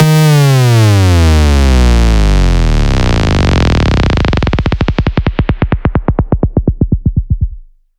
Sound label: VFX2 Sub